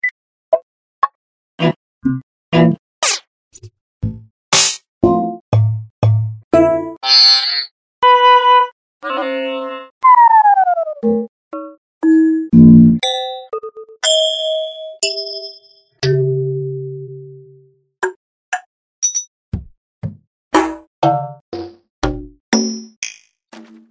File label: Ethnic, click sound